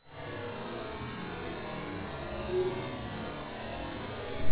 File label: flag room hum